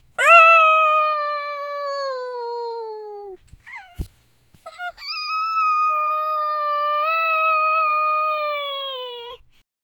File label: a small puppy voice high; dog